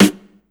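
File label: snare drum left; USB Soundscan vol.31; Miro s Grooves; AKAI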